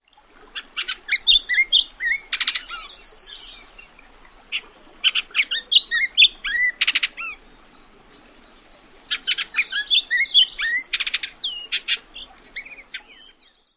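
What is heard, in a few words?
song, Bullock's Oriole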